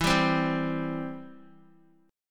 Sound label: E Minor Sharp 5th, Guitar, 4F,4A,5C,5G,5C,6E; Em#5 strummed; x,7,7,5,7,x; JGuitar